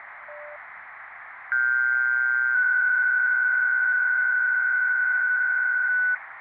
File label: PSK125 (SERIAL), AMATEUR RADIO MODE